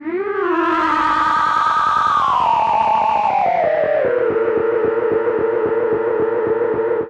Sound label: pitched, Feedback; Feedback FX; 99Sounds; Dark Engine